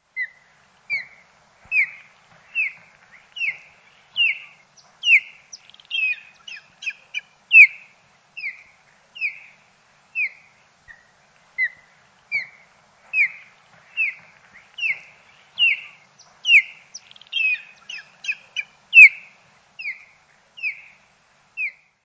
Osprey

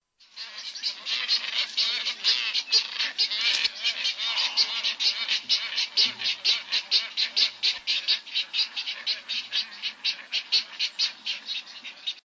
Glossy Ibis

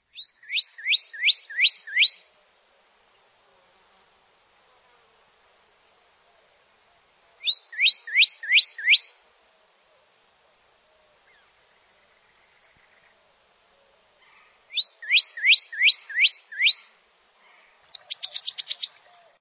Pyrrhuloxia

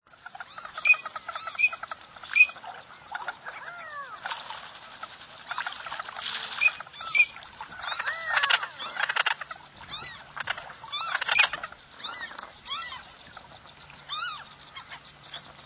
Green winged Teal

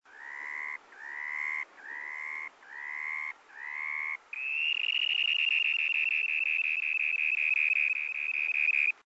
Dunlin

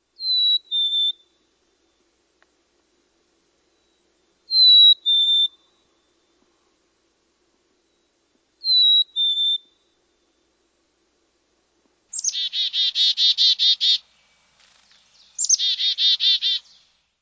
Black capped Chickadee

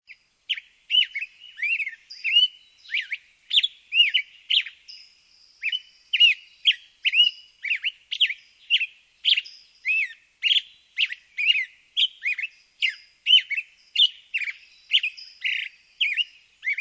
Black headed Grosbeak

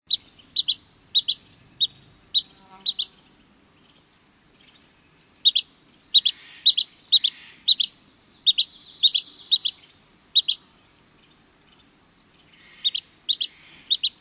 Pygmy Nuthatch

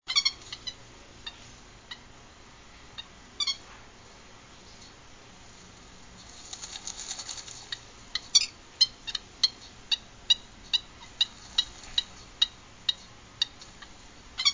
Purple Gallinule

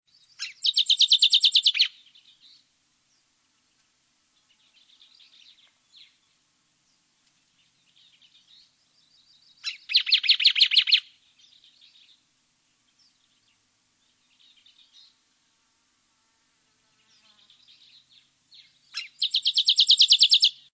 Canyon Towhee

